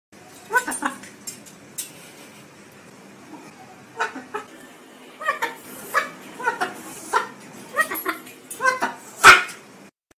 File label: bird